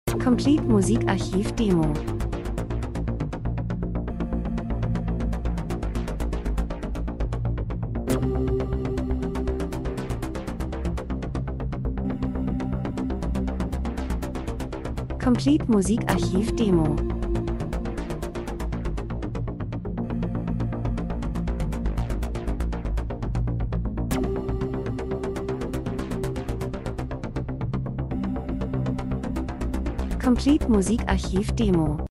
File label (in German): drums, rhythmus; Elektro Rhythmus aktiv nach vorn spannend Road Straße Akzente Menschen Zeitgeschehen Dokumentation Industrie